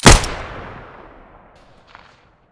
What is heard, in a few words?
muzzle fiveseven stereo, weapons